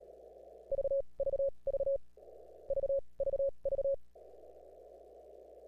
1700 Watts into a dummy load, Key - Unkey Pop Samples; Elecraft K4D; K4D FT3 CWT Audio